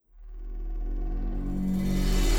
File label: Battle